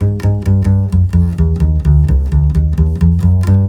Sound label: 130 NuJazz Bass Ab maj; Pro Tools Essential Loops; Digidesign